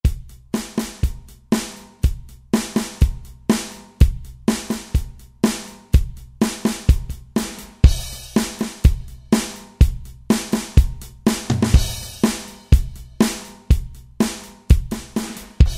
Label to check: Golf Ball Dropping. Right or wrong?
Wrong